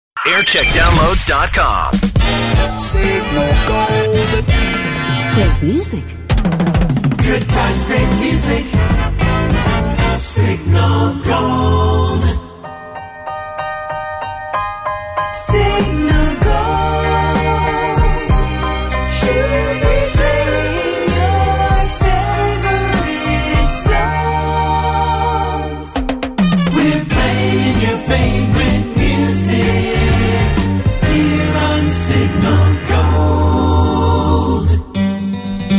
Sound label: Jingle Montage; Signal Gold; JAM